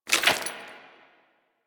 Generic Foley; Warframe; Warframes; Dagath

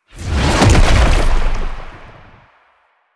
Landing Slam; Chem Nox; Warframe; Quests; Nineteen Ninety Nine

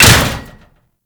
Mace Impact Metal

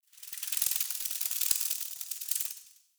Cave Sprout Insect Close; Midrath Island

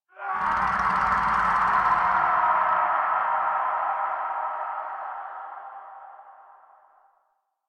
Distant Medium Howl; Warframe; Sacrifice quest; Umbra

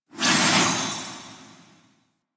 Alchemist Transmute Ability Loop End; Warframe; DE; Warframes

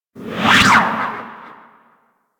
Grapple Pull; Warframe; Warframes

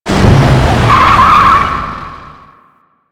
Lasrian APC Move Stop; Enemies; Warframe

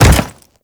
Step Default; Leto

